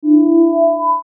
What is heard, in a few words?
Rollover Beep Tone Multimedia; Web Interactive